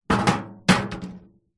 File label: metal small container bin empty knock over or drop on concrete; zapsplat